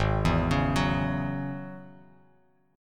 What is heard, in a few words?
Ab 7th Suspended 4th Sharp 5th down Bass Standard; Ab7sus4#5 arpeggiated